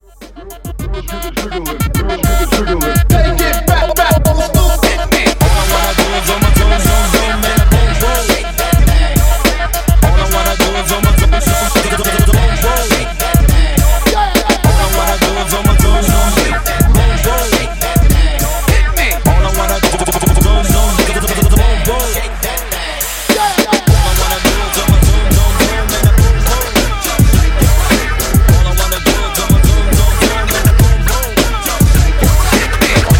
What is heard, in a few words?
Oldschool Party Break, 104